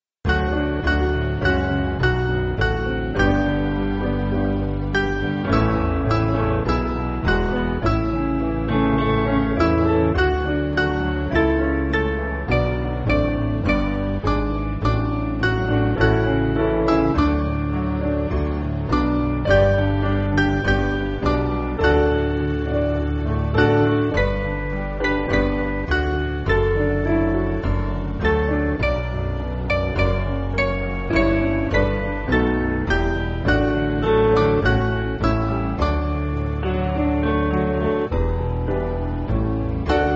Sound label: Make Me A Captive Lord, piano; Leominster; Mainly Piano (CM)